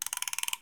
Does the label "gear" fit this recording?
Yes